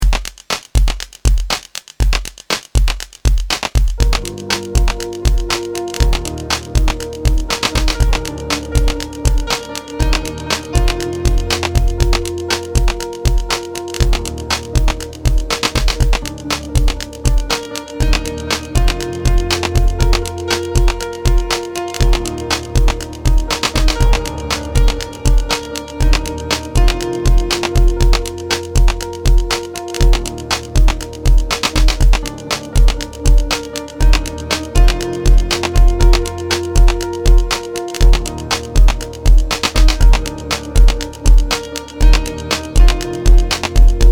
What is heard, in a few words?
piano and beat